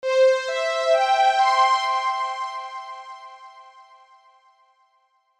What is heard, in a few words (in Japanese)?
pinponpanpon